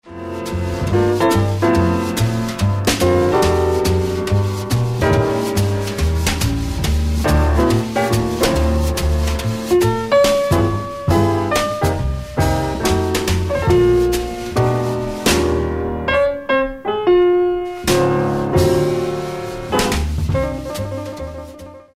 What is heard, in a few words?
Piano Jazz